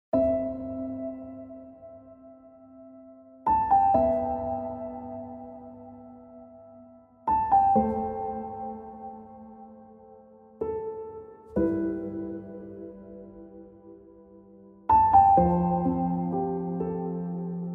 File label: Ringtone